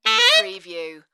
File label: Sax Roll